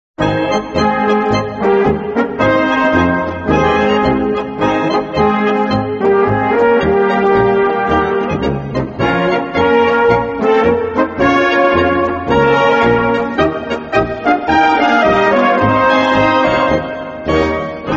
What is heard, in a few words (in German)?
Blasmusik